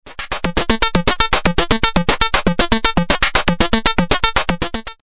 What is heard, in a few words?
Pll slew fm; experimental